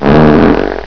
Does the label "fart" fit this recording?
Yes